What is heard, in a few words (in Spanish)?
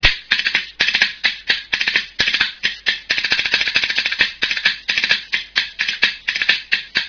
tejoleta